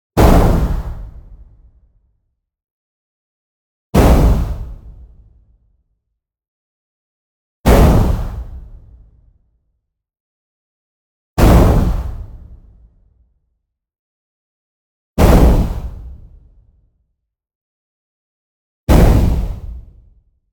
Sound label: IMPACT WHITE NOISE Low End Burst; boom library; Anime Essentials